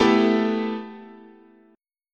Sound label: A 7th Suspended 2nd